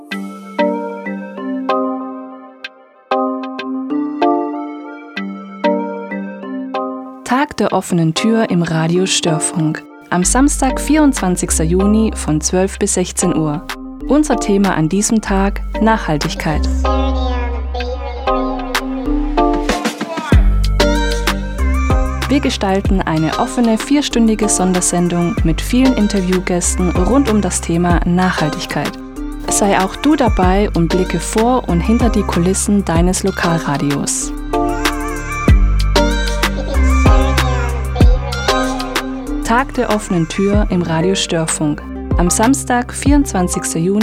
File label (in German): radio jingle tag der offenen tür